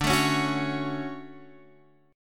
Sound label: D Major 7th, Guitar, Open F; DM7 strummed